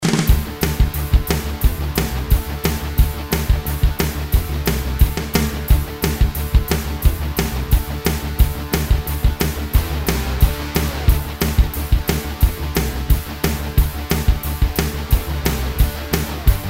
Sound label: poprock